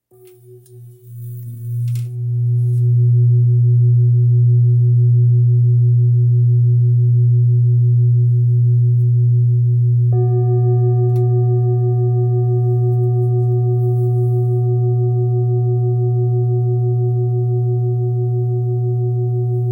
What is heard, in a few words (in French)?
Bol bengale fa diese